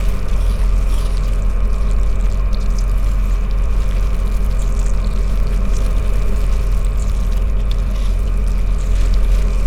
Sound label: ship juggernaut idle; biogenesis